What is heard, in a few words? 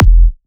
Punchy Kick